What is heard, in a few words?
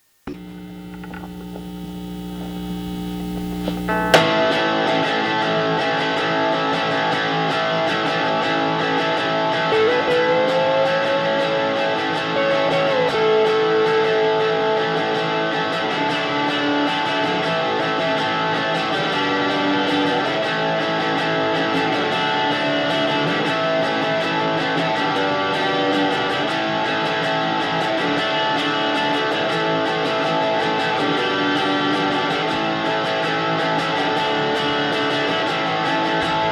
Blues